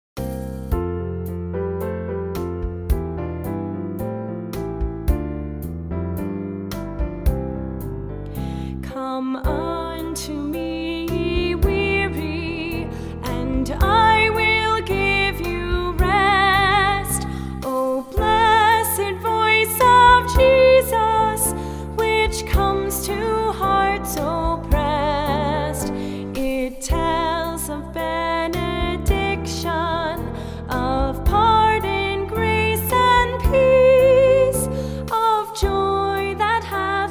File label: Vocal, hymns; Come Unto Me Ye Weary